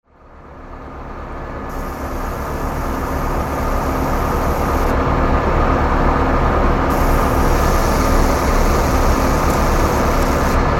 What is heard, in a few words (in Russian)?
zvuki betonomeshalki